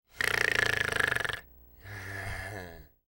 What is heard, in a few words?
snoring